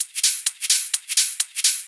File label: Hihat Loop 128 BPM